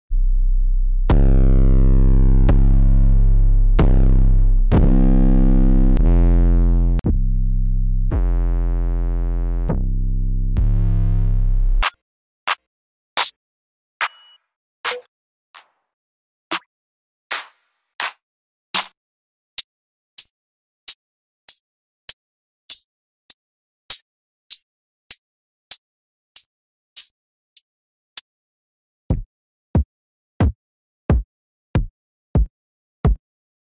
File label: drum kit